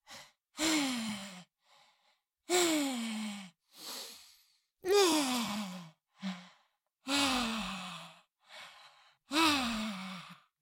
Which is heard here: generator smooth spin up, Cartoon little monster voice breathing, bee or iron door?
Cartoon little monster voice breathing